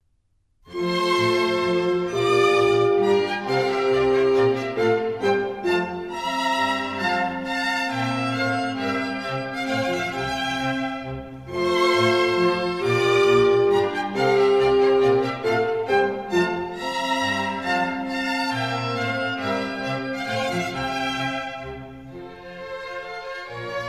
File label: Minuets, F major; Mozart; Dances and Marches